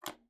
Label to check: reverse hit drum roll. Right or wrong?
Wrong